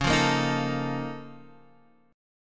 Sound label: Bb Minor Major 11th Db